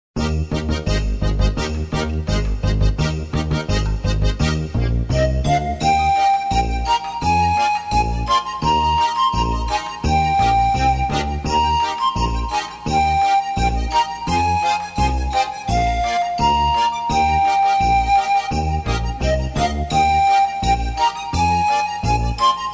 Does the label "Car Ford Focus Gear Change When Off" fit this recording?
No